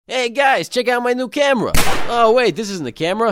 camera

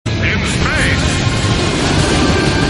in space music

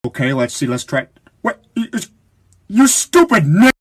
dreamy

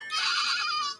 baby goat at the end of baa

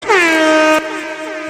horn short

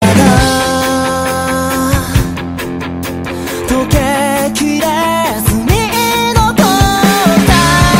ringtone

